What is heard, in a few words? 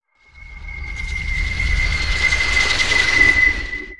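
Siege Field Arrows Rain Travel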